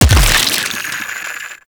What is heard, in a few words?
Golem Grenade Explosion; Warframe